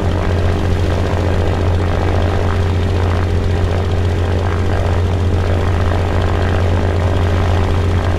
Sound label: me109 cockpit, engines; War Thunder